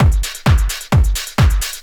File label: drum 130 techno; Techno Trance; DRUMLOOPS; Techno Trance Essentials